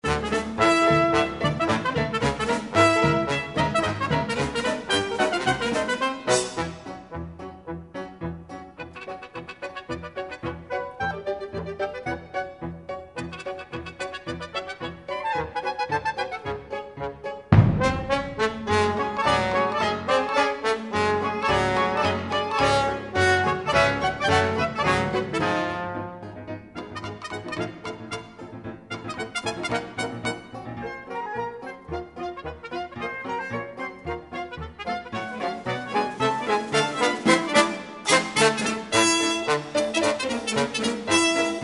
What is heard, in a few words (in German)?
fuer orchester